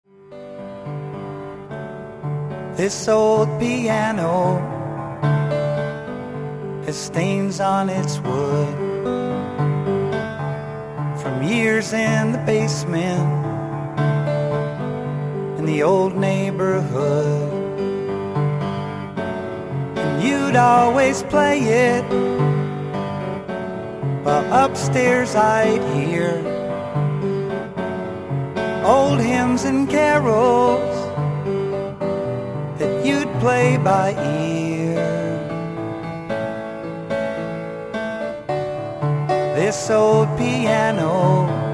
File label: piano